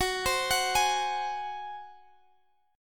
F sharp Suspended 2nd Flat 5th, Guitar, Open G; chordshape; down

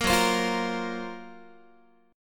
F sharp Major 7th Flat 5th F sharp, Guitar; F#M7b5 strummed; 4A,4E,5A,5D,5G#,6C#; chord shape

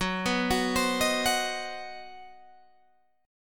C Minor Major 7th Flat 5th Gb, chord

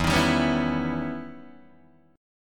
E Minor Major 13th E Guitar DADGAD

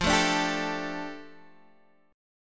F 6th Add 9th F Guitar Open G

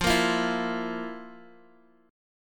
F Major 7th Suspended 4th, Guitar, DADGAD

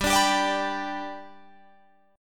G Major G down Guitar Drop D

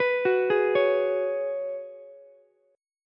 F sharp Suspended 2nd Suspended 4th, Ukulele; arpeggiated; JGuitar

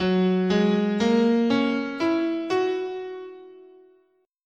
F sharp Dominant Pentatonic, scale

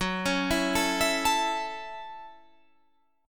A 6th, F sharp, chord

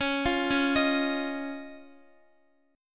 Db Major Add 9th, Guitar; arpeggiated; x,x,11,10,13,10; 4E,4A,5D,5G,5C,6F

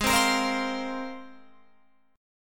Ab 6th Add 9th Ab, Guitar, Open F; 20,19,22,19,20,19; strummed

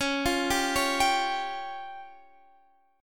Db Major Flat 5th, Guitar, Standard; arpeggiated; JGuitar

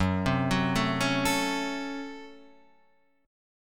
C sharp Major 7th Suspended 4th Sharp 5th F sharp

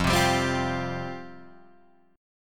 F Suspended 2nd F down Guitar Open G

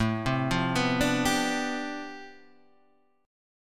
D Minor 13th A

